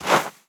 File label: snow, player steps; quake2